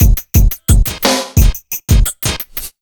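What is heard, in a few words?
175 UK beat, Ragga & Dub Reggae; USB Soundscan vol.27; AKAI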